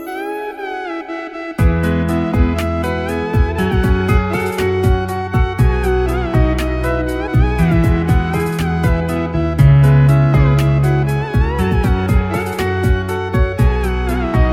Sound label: nada dering al hijrotu instrumental